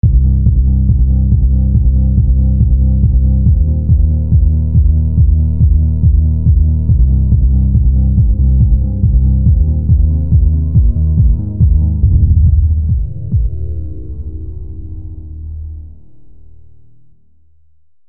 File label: bass